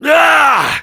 Heavy pain severe fr; Douleur intense 2

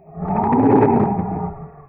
Megalodon roar; Рев 2; Pressure

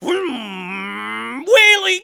motorcycle

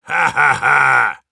Heavy laugh evil, ru

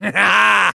laugh evil, fr

